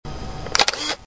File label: camera